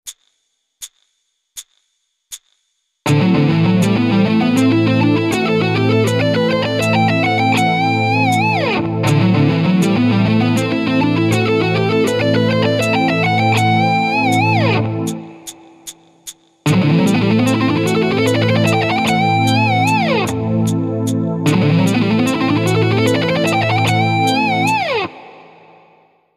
economy picking

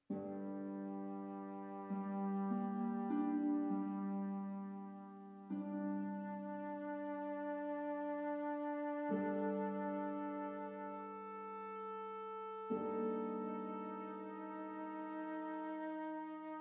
Violin; Birth Tub Scene

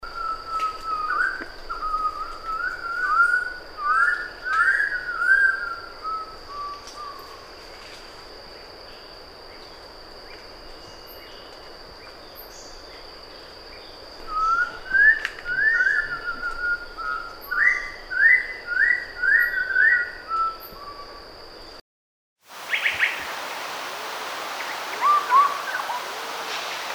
hooded pitohui call song; Animal Sounds

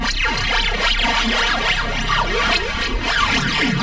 Sci-Fi

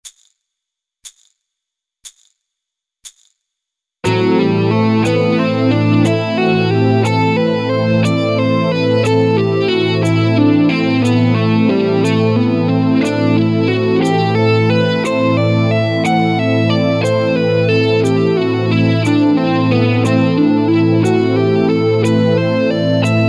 Metal Bootcamp Ex

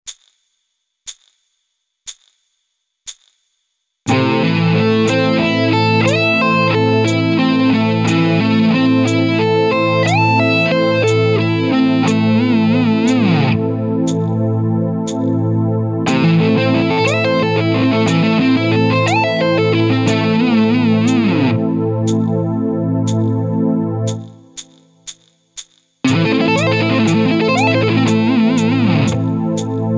Sweeping

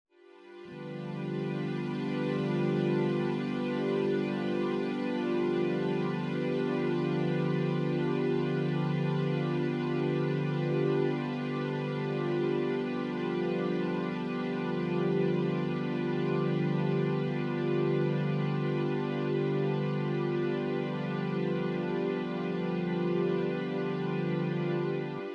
minorization backingtrack; Martino

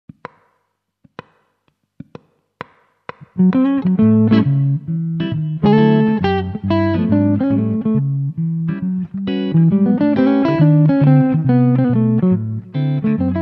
Blues Solo

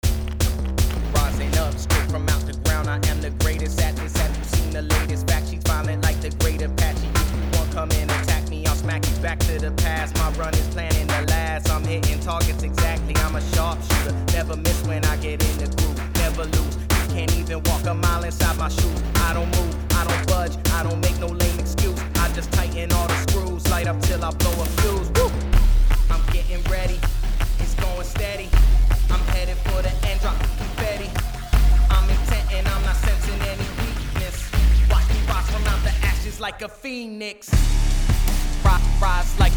rap before mix

